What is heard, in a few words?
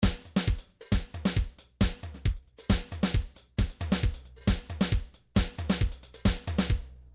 trance midi loops beats; Newtronic Trance Constuction Kit; heady